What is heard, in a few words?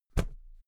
briefcase close damped; industrie wirtschaft; bueroarbeit